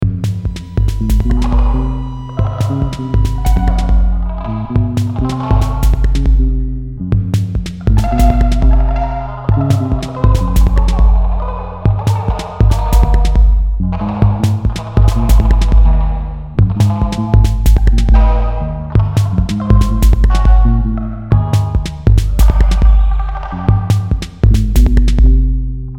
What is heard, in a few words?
phone chatting blues